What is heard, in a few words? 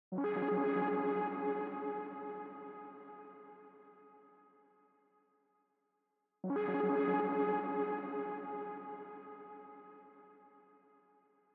152 BPM F# Min Arp; Melody Loops; Loop Stems; Hip Hop; Cymatics